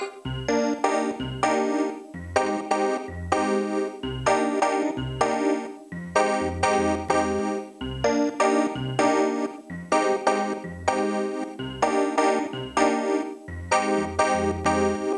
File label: synthe full